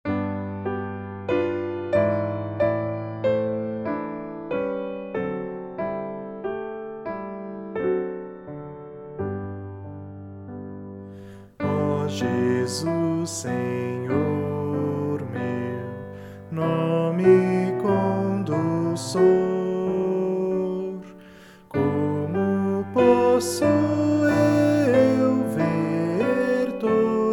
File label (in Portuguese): voz piano